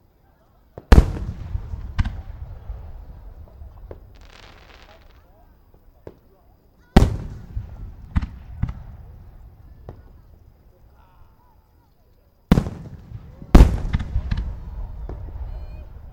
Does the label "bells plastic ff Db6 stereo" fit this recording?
No